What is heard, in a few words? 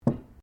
cutlery general place cloth, Household cutlery; Kitchen